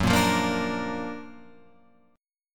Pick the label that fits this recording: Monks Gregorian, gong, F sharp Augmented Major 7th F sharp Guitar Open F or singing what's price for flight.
F sharp Augmented Major 7th F sharp Guitar Open F